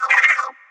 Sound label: Cat meow; Super Mombo Quest